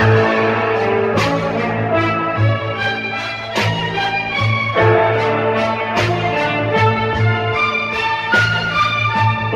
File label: strings, Sad